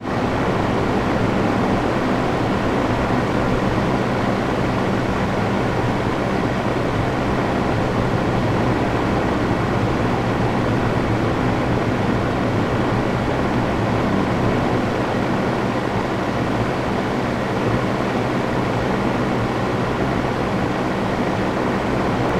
industrial fan large operating

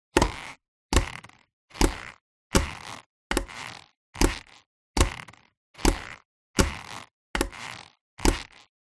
crutches walking wood floor creaks of handle; zapsplat

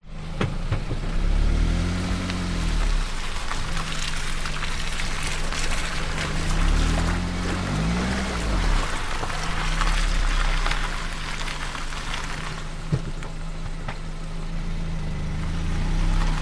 transport car manoeuvre wet muddy ground slowly

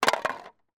empty drinks can roll or drop on street; foley

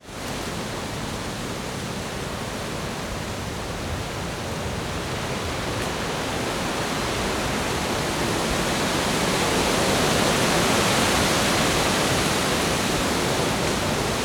nature wind trees strong park

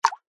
water single drip; zapsplat

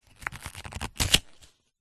foley paper cut slice open knife letter

